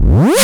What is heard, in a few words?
Scratchy, FX; Vengeance Samples